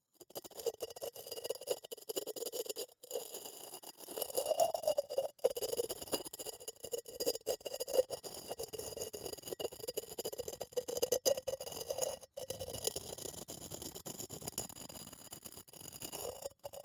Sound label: Jar, terracotta, ceramic, lid, rubbing, scraping, grating, rough, ragged, variable; Eiravaein Works; Vaeyan IV